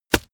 plastic tense bag quick

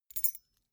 key ring small rattle air soft

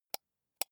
computer mouse wheel click slow soft

